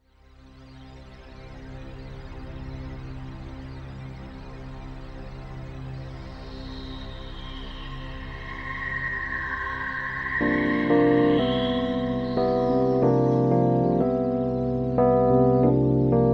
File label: moody keys; Chilled, kinda lo-fi